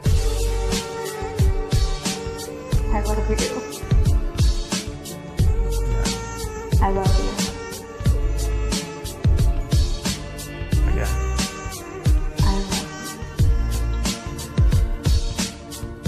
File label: Heart Touching Ringtone